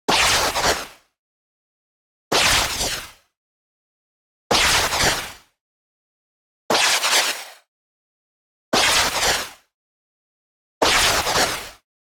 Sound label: WHOOSH Noisy Air Hit; boom library; Anime Essentials